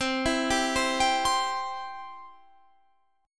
C Major, Guitar Standard; C arpeggiated; 20,19,17,17,20,20

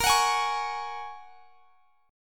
A sharp Minor 7th Sharp 5th A sharp; A#m7#5; strummed; x,x,20,23,21,21

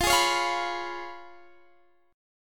C sharp 7th F, chord

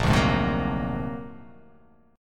Bb Augmented 9th Bb; Bb+9 strummed; JGuitar

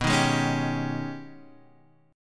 G sharp Minor Major 11th B

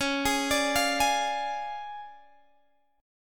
D Minor Major 7th Flat 5th C sharp, guitar

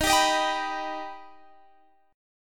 D sharp Augmented D sharp Guitar Standard; D#+ strummed; x,18,17,20,20,19; JGuitar

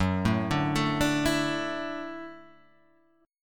F sharp Minor 7th Sharp 5th; F#m7#5 Chord {2 0 0 2 3 0} arpeggiated

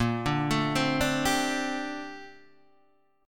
A sharp Major 13th, Guitar Open D

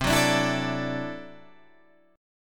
D-Major Add 9th, C, Guitar, Standard; strummed

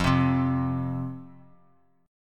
F 5th F, down, Guitar; F5 strummed

